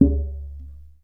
Mallet Hit, Percussion One Shots; Essential Percussion

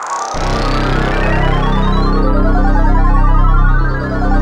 SYN JD8000, Roland; Sound & Vision - Gigapack I CD 2; JD 800/990

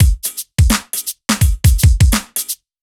Kit Heavy Swing, 85bpm; off the grid

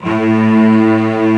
STRING A1; E-MU Formula 4000 Series Vol. 5; Protozoa; Proteus1 Instrmt

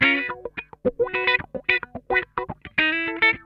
LICK, Funk Guitar; 90 s Sample CDs; Zero G

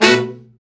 BRA SECT; BRA SECTIONS

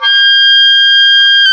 oboe; Roland LCDP04 Orchestral Winds; Oboe Brth 1

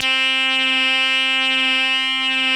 SQUEEK, LEADS; Masterbits; Vintage Voltage

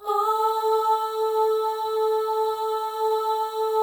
OH, FEM, Symphony of Voices; Spectrasonics

